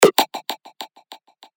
percussion; Moon; revealed percussion vol 2

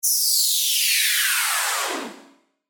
FX Quick Noise; revealed serum 2 bass tech house vol 1

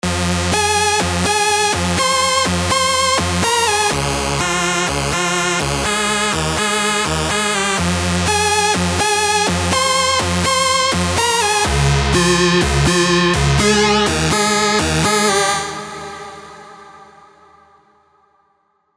Serum 2 melodic leads; Funkatronique 2055; Revealed Serum 2 Melodic Leads Vol. 1

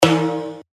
D#, percussion; revealed percussion vol 1

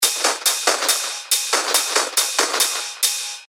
techno loop 140 BPM; Wildcat; Revealed Techno Top Loops Vol. 8

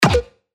Snare; Hiccup; revealed claps snares vol 2

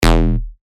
Bass Shot E, synth shots tech house; Agua; revealed

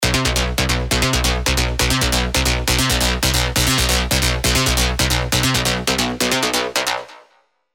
Modular Space, rolling bass; Revealed Serum 2 Rolling Bass Vol. 1

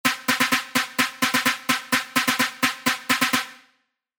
LD, dirty dutch leads; sylenth1; Lekker Pop; revealed sylenth1 dirty dutch leads vol 1

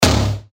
G#, percussion; revealed percussion vol 1

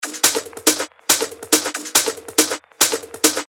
140 BPM, techno loops; Alaska; Revealed Techno Top Loops Vol. 9

